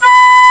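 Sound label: FLUTE; CMI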